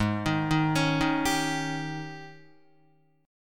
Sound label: Ab Major 7th Suspended 4th Ab Guitar 4F,4A,5C,5G,5C,6E; arpeggiated; 3,6,3,6,3,3; AbM7sus4; JGuitar